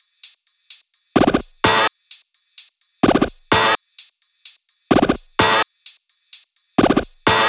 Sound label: 128BPM Tribal Kiss Kit